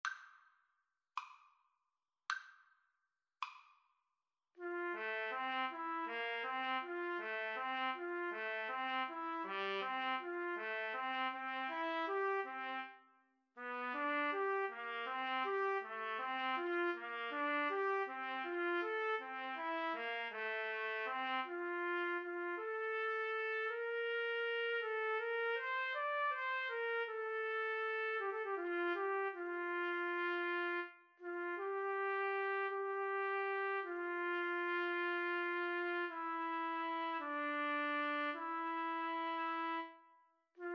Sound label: Trumpet Duet